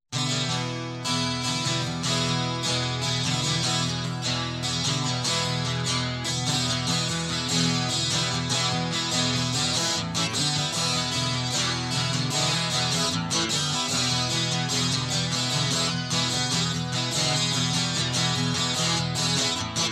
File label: Guitar